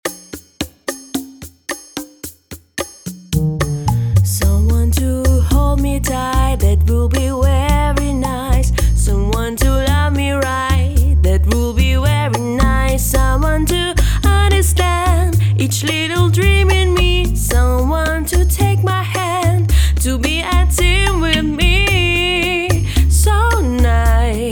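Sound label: samba, accompaniment example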